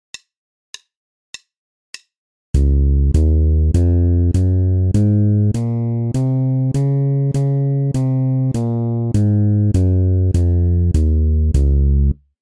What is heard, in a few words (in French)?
Gamme majeure de Reb, basse; exercices